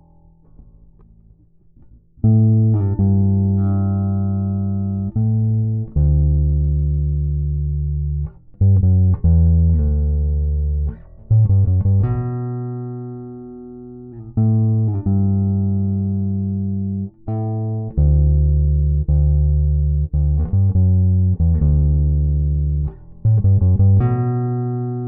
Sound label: bass riff